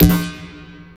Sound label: SYNTH PC, DANCE KIT